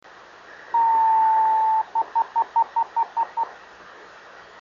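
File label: 8 dots 26510KHz, driftnet beacons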